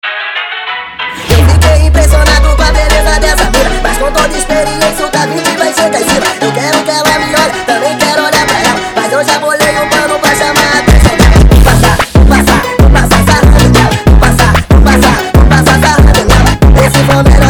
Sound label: bad ending funk